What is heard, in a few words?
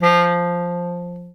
Bass Clarinet F2; VarazdinOrchestral